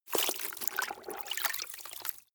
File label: clothes water wring medium